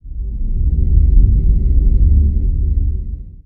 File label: rnd dark, ambient outdoor